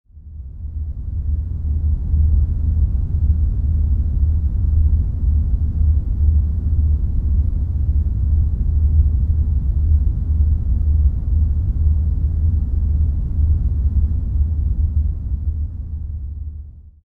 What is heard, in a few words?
Orange Noise Binaural Beats 2Hz Delta Wave Range